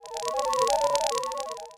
Science Fiction Sci-Fi Electronic Robot Scanner

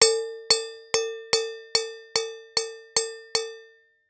Cartoon Drum Cymbal Tap

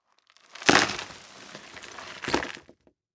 Impact Dirt Dump On Plastic

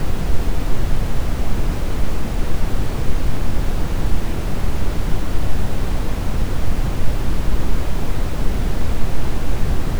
Brown Noise Independent Channels

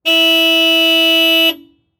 Transportation Cars Honda Civic Honk Horn Long

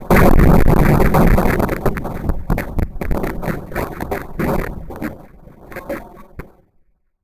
Transition Blast Distortion; Adobe; Production Element

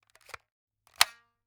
Foley Toy Gun Shotgun Cocking

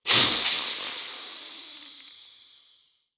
Horror Scary Vampire Hiss Wet Growl Hiss

